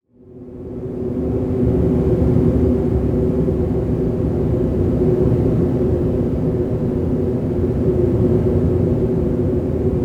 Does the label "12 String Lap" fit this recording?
No